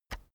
keyboard external enter push heavy

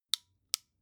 flashlight off slow

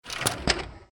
door lock

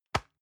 envelope drop medium

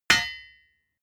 hammer hit steel bright

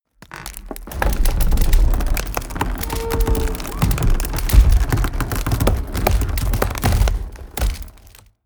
wood medium pressured

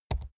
keyboard laptop key press fast soft

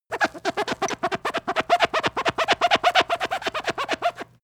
sponge squeak fast

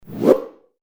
fight swosh medium fast

